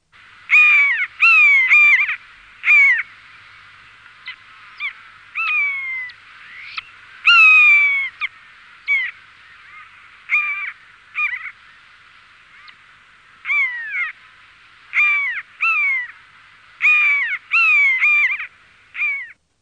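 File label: Common buzzard; Madarak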